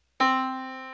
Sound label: yamaha Disk